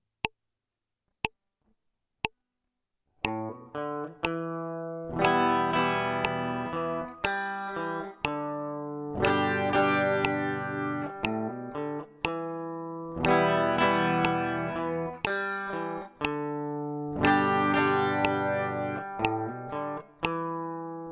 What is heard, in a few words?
intro melody full version